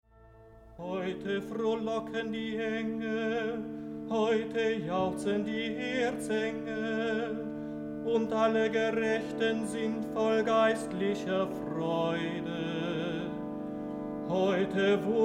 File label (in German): Heute frohlocken die Engel, 1 stimmig; Langfassung; In der Nacht; o selige nacht